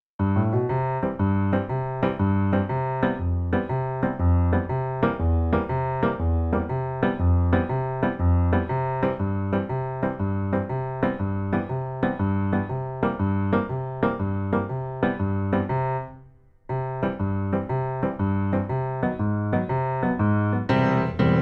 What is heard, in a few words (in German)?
Instrumental